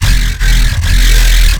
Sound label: Em 140BPM Growl